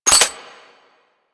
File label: Mech, Weapons; Kuva Hek; Warframe